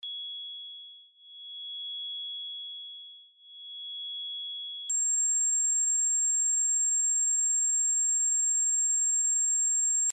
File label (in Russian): pisk ushnoy